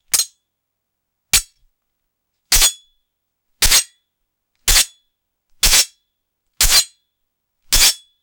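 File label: ninja double daggers slashing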